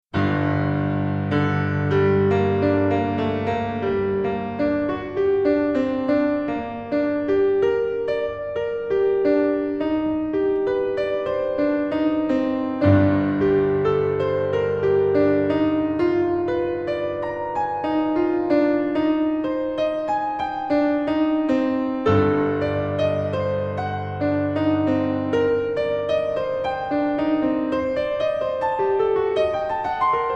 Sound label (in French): concerto pour piano, andante sostenuto; SAINT-SAENS